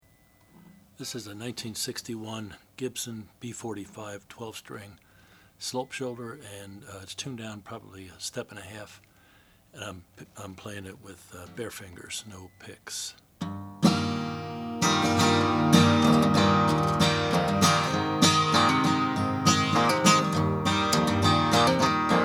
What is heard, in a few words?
gibson b45 12 cherry sunburst